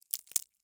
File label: CRACK Bright Crisp; mono; Universal Sound FX; BREAKS & SNAPS